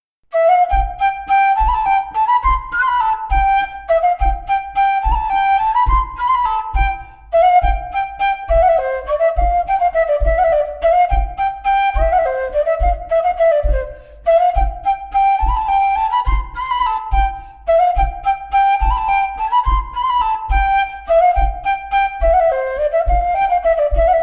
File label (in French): Auvergnasse; Ms Fricou